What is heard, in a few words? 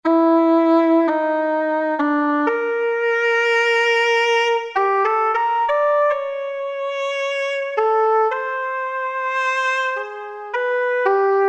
Solo; Cheetah MS6